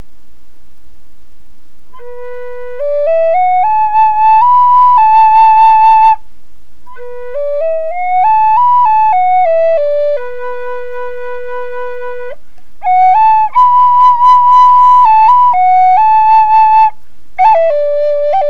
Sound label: B 75 bore, flutes